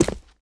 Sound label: concrete, footsteps; player